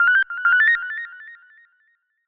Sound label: beep email